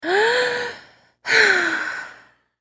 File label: Human Female Sigh Inhale Exhale; Sighing (Sound Effects:Voice)